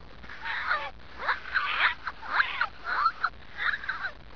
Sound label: idle, headcrab poison; hl2